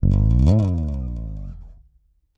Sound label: Slide A, Bass; Construction Kit; Riffs, Patterns Licks; Bass X